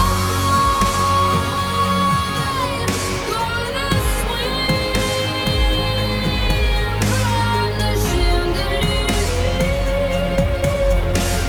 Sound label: Pop rb